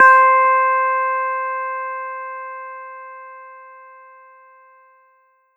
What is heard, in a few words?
Yamaha EX5 Clean Lead C5